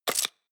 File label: medieval menu light open